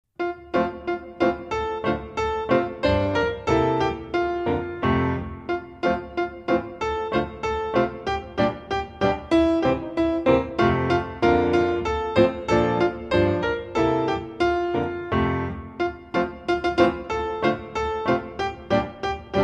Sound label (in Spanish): musica; spa